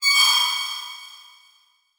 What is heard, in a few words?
Transition Bell Hit; Adobe; Production Element